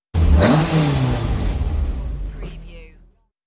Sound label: Rally car rev; beanstalk audio